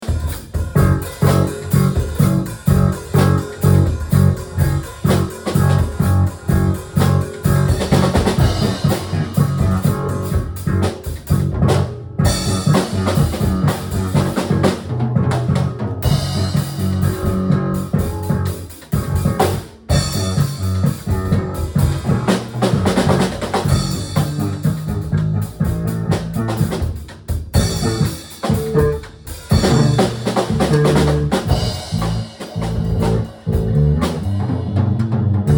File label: Slap Bass